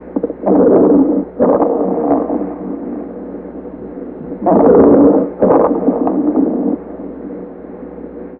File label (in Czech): velke chropy; plice